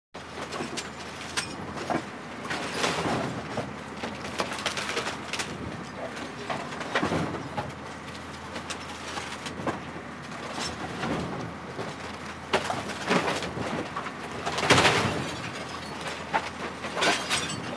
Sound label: Destruction, Television Pre 1976; BBC Sound Effects Library